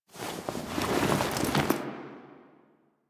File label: Foley Ship Move Three; Drifter